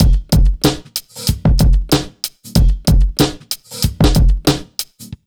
94 BEAT, Underground Hip Hop; USB Soundscan vol.02; AKAI

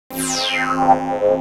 CYCLE QUART, Transwaves; Soundengine - CSR-000 The Art of Transwaves; HARD MOD

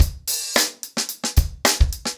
110BPM, acoustic drums; The Roots Drumloops

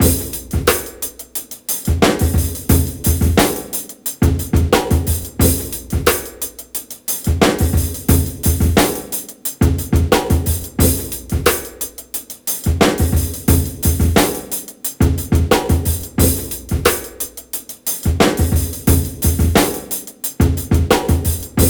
89 Looseley Jazzdrum, Breaks; Lockdown Breaks

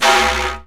SIKU CHIFF; World Combo

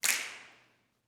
snaps, gospel; ILIO; Vocal Planet

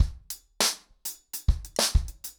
100BPM, ACOUSTIC DRUMS

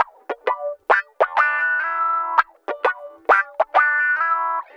Guitar Loop; Ambient Grooves

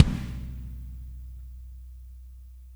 AMB KIK; ROCKER KIT

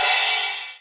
CRSH, Crash Cymbal; MONO CRASH; AKAI S6000 CD-ROM - Volume 3

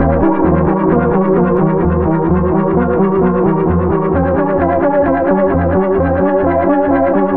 synth arp; Electrocution

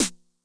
SNARE DRUM Med Tune Low Tone, 909; classic machines; 909mod

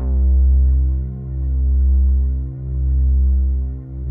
HORNS C2

